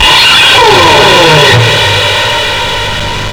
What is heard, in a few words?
PITCH guitar; GUITARS KIT; Big Beat Samples & More; AKAI